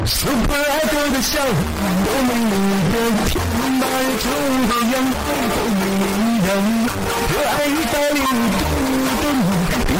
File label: bass boosted